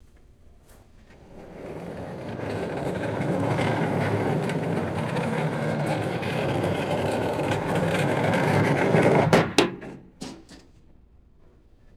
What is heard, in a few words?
metal cart rolling and stopping; vehicles